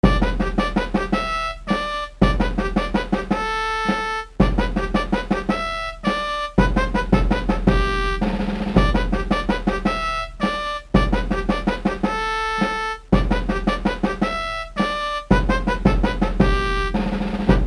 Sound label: Military March Psx Bitcrush